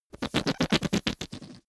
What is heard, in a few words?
whoopee cushion fart stutter